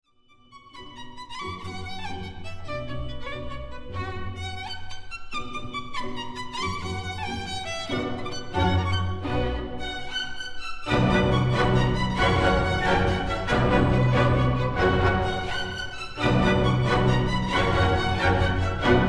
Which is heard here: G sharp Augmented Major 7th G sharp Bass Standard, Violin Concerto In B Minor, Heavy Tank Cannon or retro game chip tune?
Violin Concerto In B Minor